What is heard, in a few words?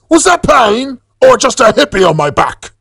hurt; tf; saxton hale